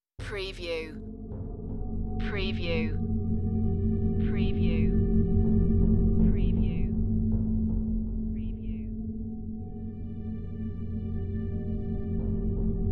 Trailer Production Elements - Texture - Subtle, Distant, Eerie, Slow